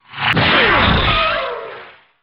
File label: Skill Energy Beam